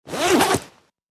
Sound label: Jacket Zip Unzip, zippers